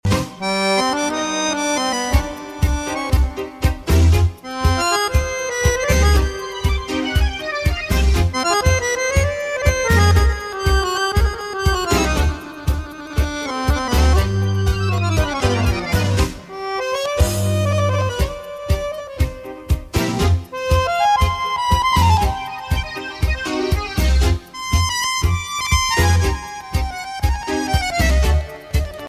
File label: Russian melody, ringtones